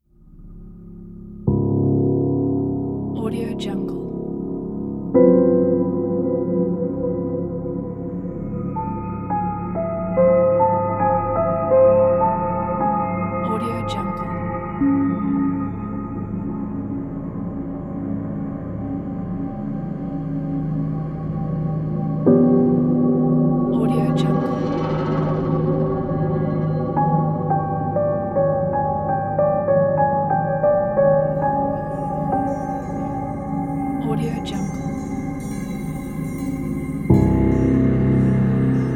dark scary horror piano

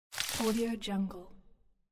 flower grow

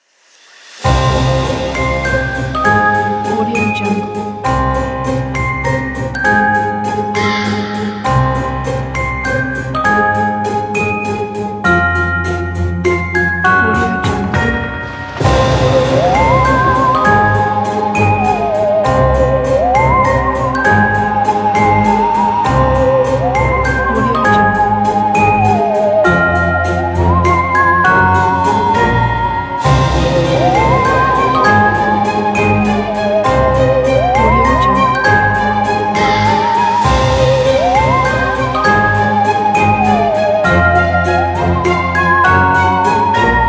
the halloween

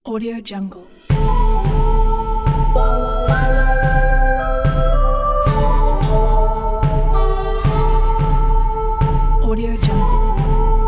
spaghetti western

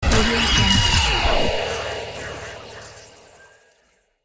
space shrapnel; sound ideas

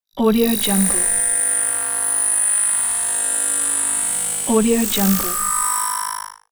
scifi data load

